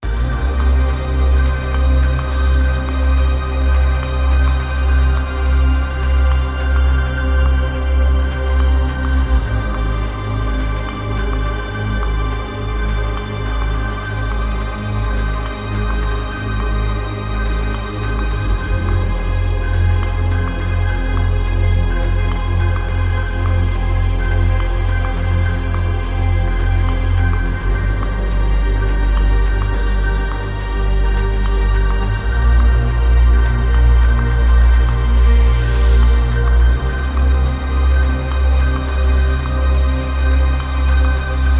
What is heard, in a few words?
Ambient